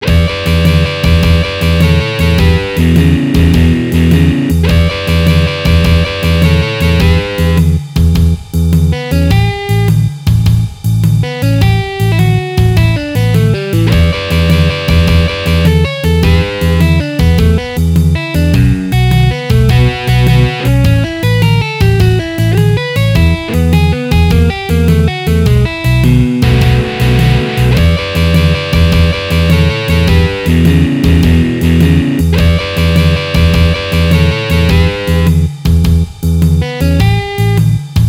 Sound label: Variations Blues